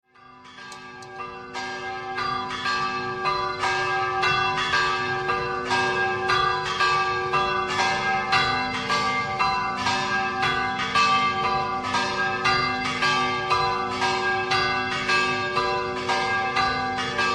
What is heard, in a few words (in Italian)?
CAMINO DI BUTTRIO, campane